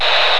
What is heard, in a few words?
radio